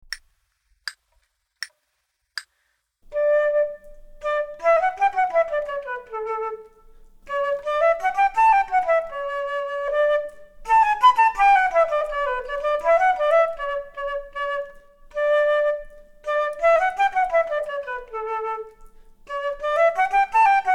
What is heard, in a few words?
Duets flute; Selected Duets for Flute Podcast